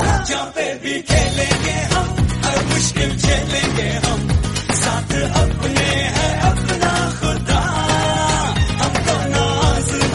patriotic tone

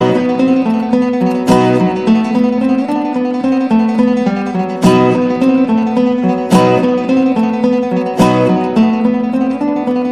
relaxing croatia

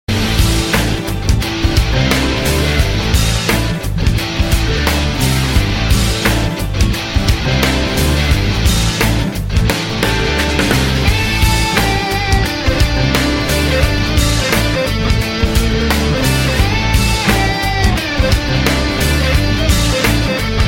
instrumental ringtone

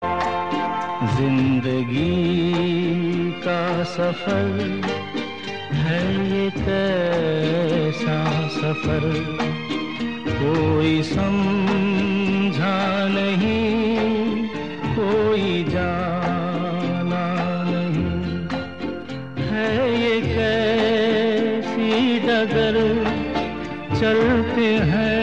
Hindi ringtone

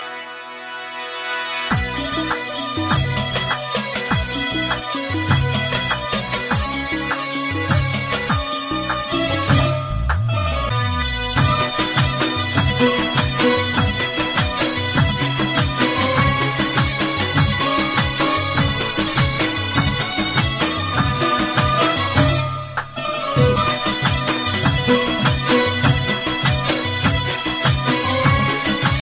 arabic tone

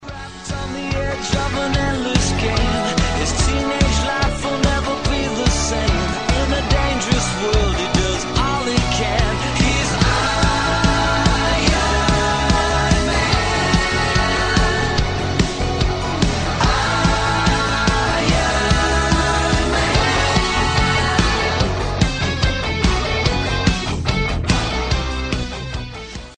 movie theme song; iron man

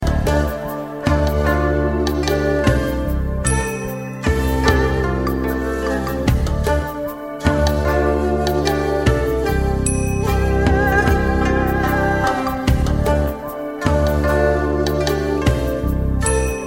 chinese musik

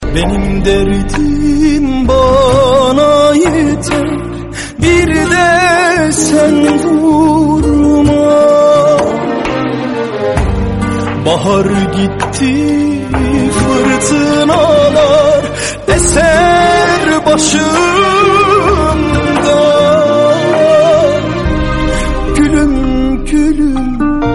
turkish tone

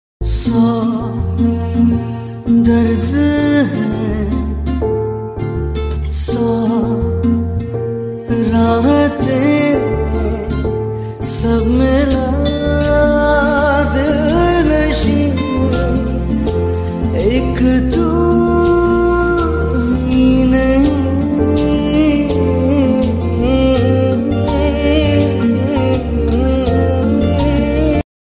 unplugged acoustic ringtone

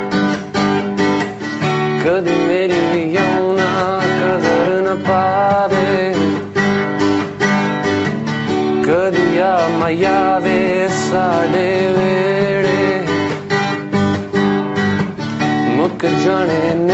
guitar new tone

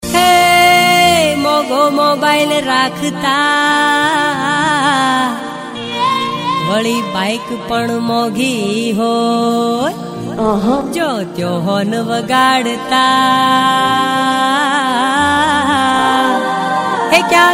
gujarati sanedo ringtone

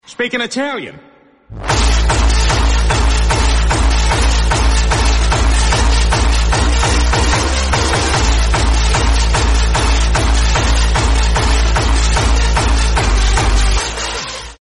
italian hardstyle

